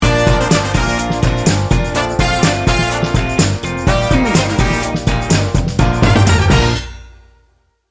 happy upbeat retro soul sting bumper

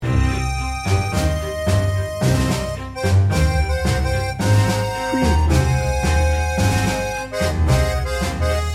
tango loop

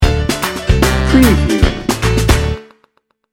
new orleans strut sting bumper

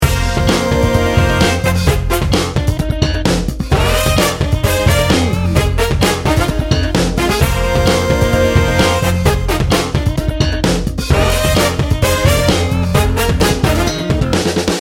festive funk loop

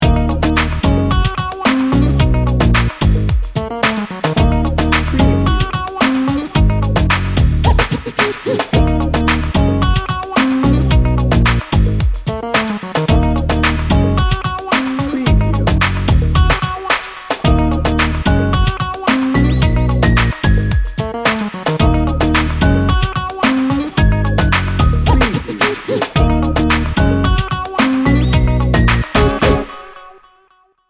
cool funk

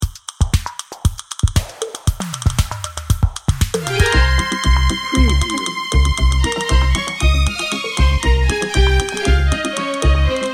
paso doble dancer no snare

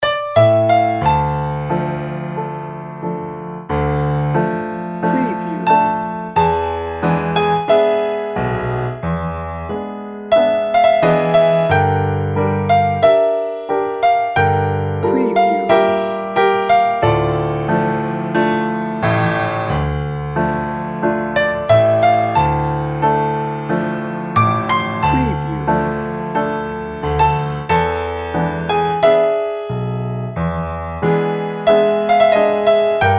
exotic mystery piano violin

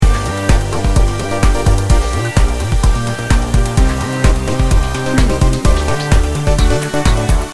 hi-nrg loop

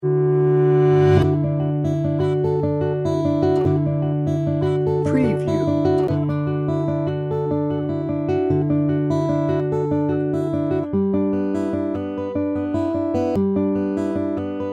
sweet and mellow guitar only

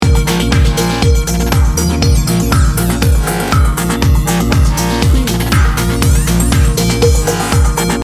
grungy edm loop

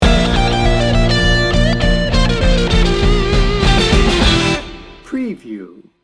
surfer down sting bumper

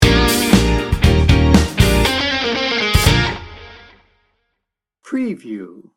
funky and cheerful sting bumper; Bright and bouncy with a catchy melody featuring ukulele, recorder and female “la, la, la”

